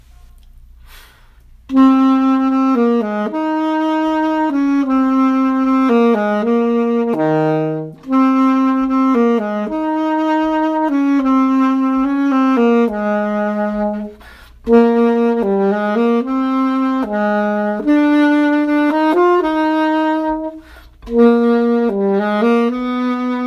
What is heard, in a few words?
tuba